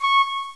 pipe

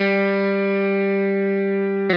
Arp.

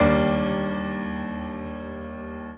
piano sus

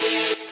saw

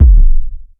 drum hit finish

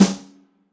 soft hit clap; osu

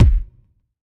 dance kick

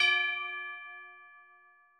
D6 bell

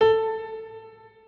Piano A2

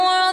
vocal

